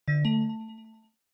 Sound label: OS Sound Notification